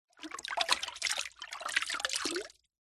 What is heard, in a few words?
household water splashes movements in plastic mop bucket; zapsplat